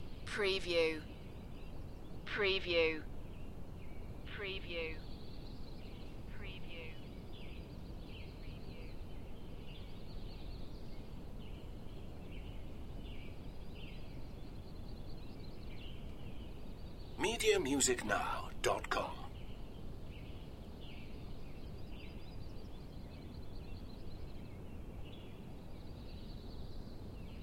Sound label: Quiet Forest Morning Loop; lynne-publishing